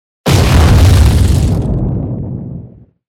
Explosion

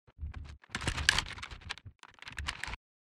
Ranger movement

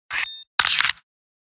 CAMERA ZOOM

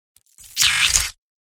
ROBOT SMALL

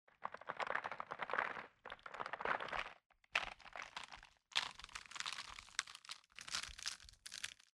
infected

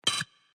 computer error sound

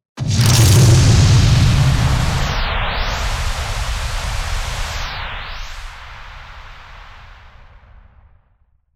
Fire element spell

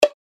POP

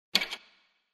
unlock

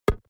UI invalid sound

